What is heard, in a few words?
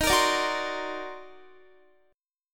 Eb Minor Major 13th, Guitar; strummed; chord; JGuitar